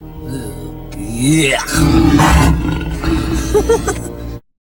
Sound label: yecch